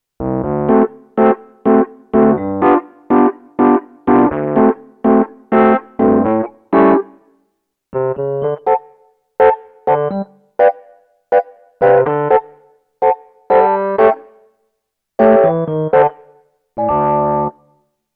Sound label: Dexibell Vivo SX7 Suitcase E Piano